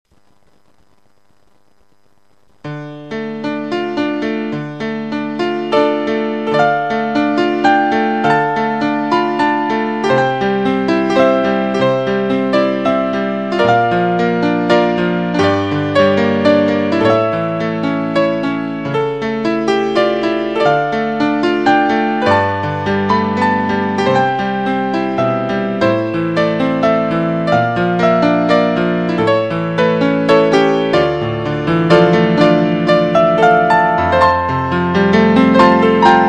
Christmas Piano What Child Is This

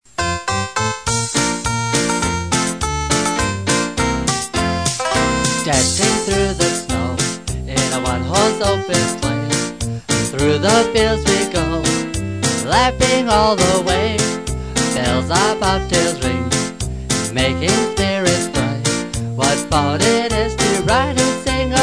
Jingle Bells

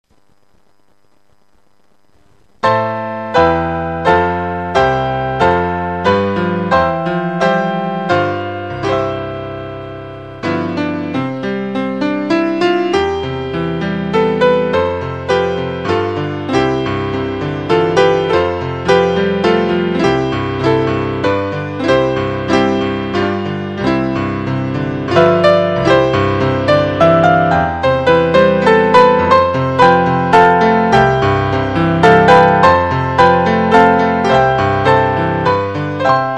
Christmas Piano The First Noel

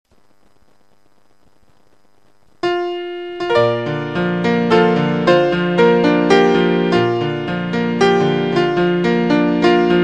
Christmas Piano It Came Upon A Midnight Clear

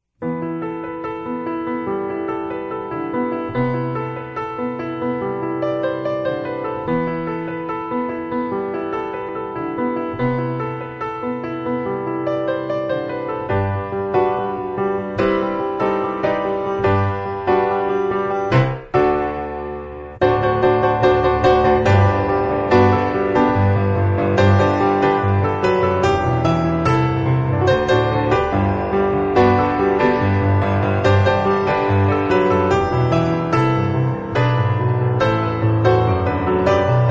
Piano

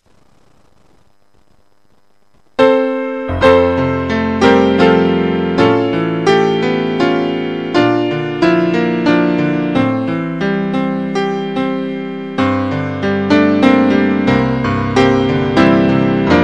Christmas Piano Away in a Manger

